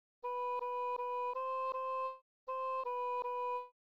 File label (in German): Rhythmus Ubungen; Notenlesen lernen